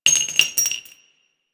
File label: Shell, Weapons; Dual Vipers